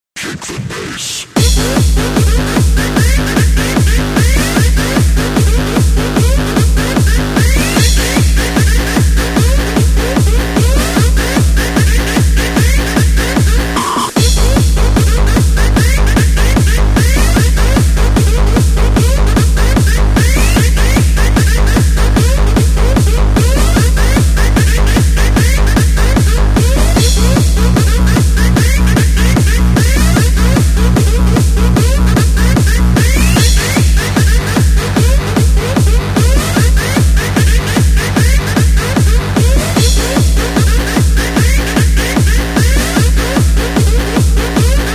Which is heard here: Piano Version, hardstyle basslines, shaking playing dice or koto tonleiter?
hardstyle basslines